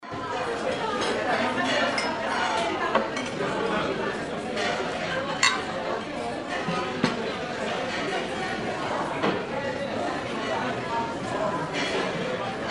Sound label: ambient pub